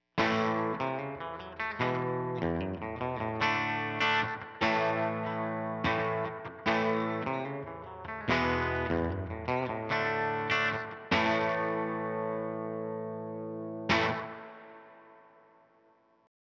Fender Broadcaster Princeton Reverb volume set to 5